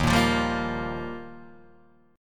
D sharp Major Add 9th, Guitar Open D

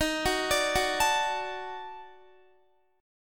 D sharp Minor Major 7th Flat 5th, Guitar; arpeggiated

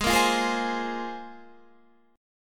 C 7th Suspended 4th Sharp 5th

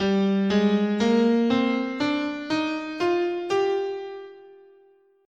G Phrygian, scale

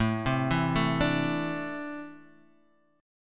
E 7th Suspended 4th Sharp 5th

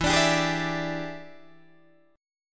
E 7th Sharp 9th Guitar Open D, chord

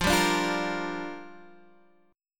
G Minor 7th, F

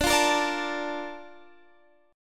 A sharp Major 7th

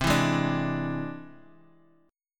E Augmented 7th C

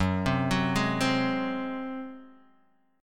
Ab 7th Gb, Guitar

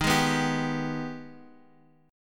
G sharp Minor Major 7th D sharp

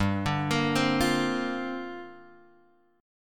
G Minor 11th G; Gm11 Chord {3 X 0 3 1 1} arpeggiated

